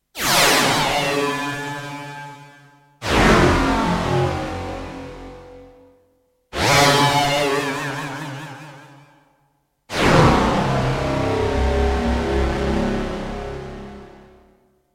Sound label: SFX Sting